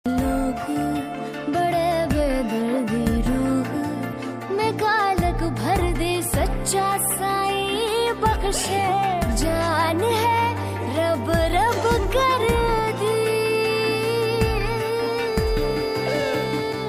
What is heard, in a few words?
Ost pakistani ringtone